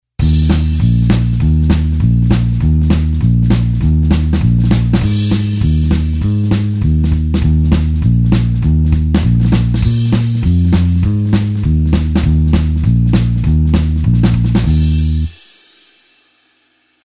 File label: blues bassline 2beat Eb